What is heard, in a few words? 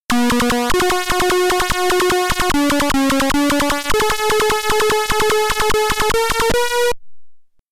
Scene Cleared (Beeper)